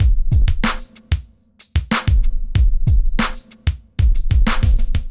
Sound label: funked 94